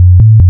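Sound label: waveform FM sine triangle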